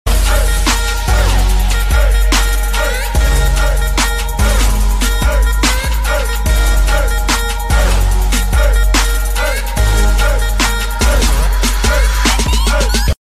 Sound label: dub drop ringtone; dub drop nifty trick